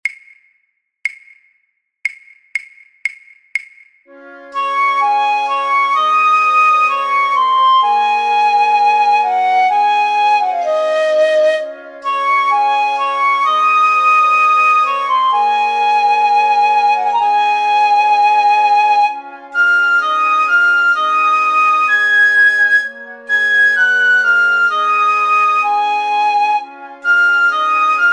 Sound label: piccolo